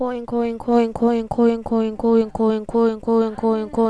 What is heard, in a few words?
coin